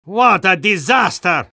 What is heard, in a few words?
spy jeers; vo